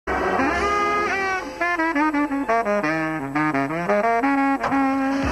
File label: solo